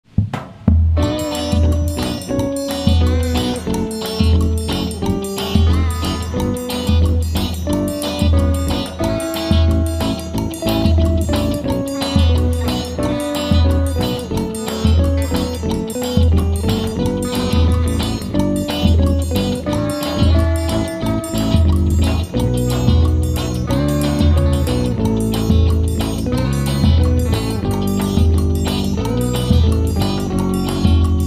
xote colorido com baião de dois

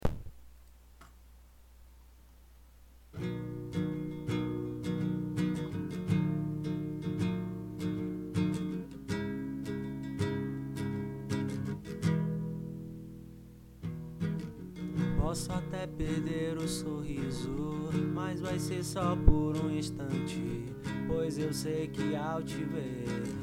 acustico

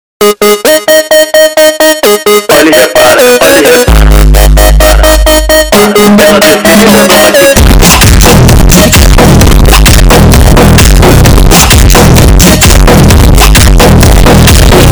remix funk batidao

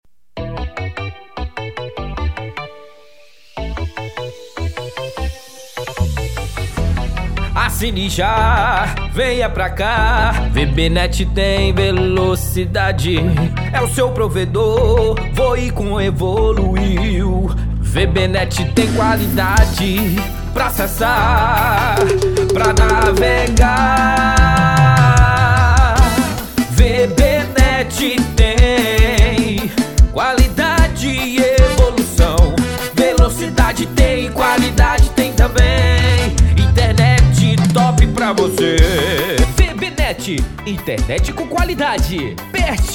jingles politicos piseiro forro; Vb Net

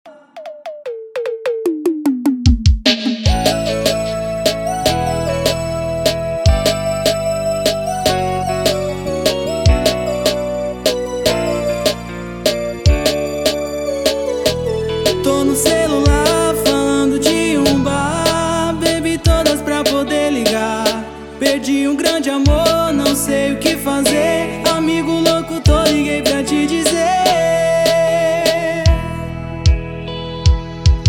versao piseiro; TF do Piseiro

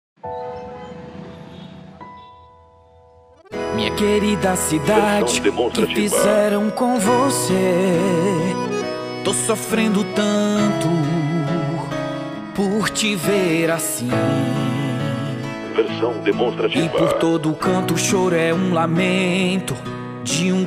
jingles politicos; jingle do lula

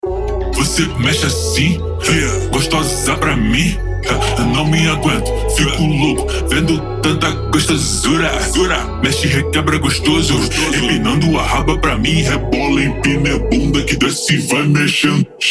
tamborzao slowed

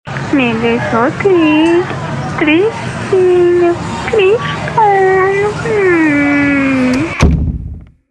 toque para celular

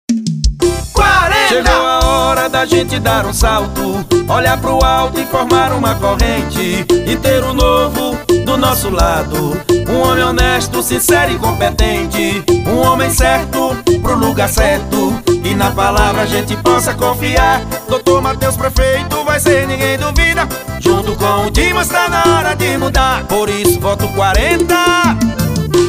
jingle hino xote; Dr Matheus prefeito

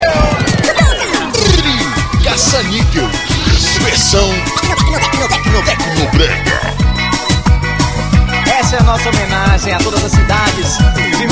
forro de minas remix

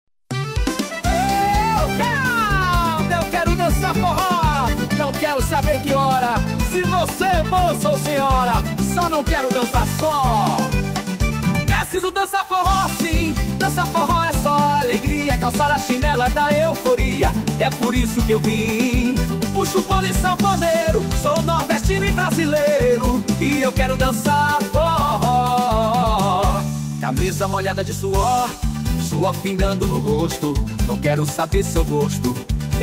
dançar forro

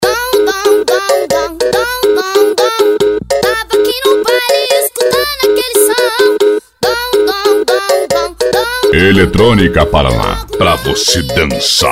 eletronica; parana; edicao fervo 2016

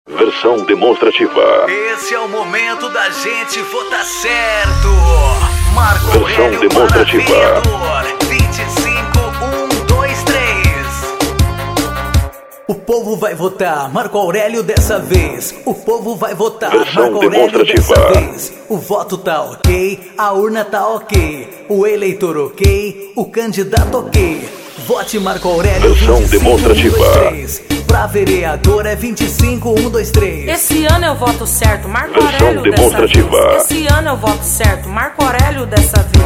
jingle politicos

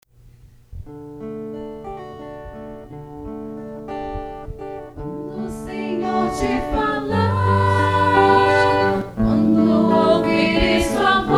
aclamação; Vozes de Cristo

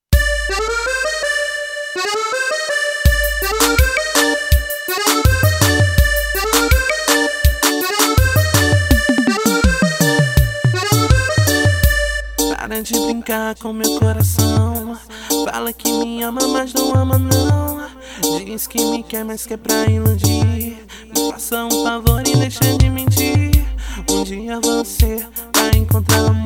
brega funk

